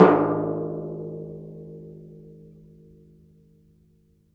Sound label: TMP A# HAN, World & Orchestral Percussion; ILIO; Synclavier Percussion 2